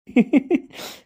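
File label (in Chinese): 笑（偷笑）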